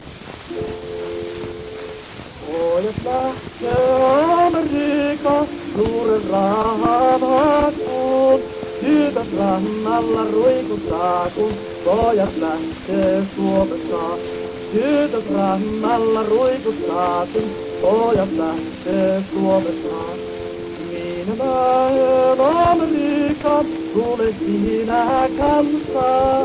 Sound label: Gramophone